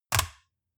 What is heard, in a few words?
metal device click lock in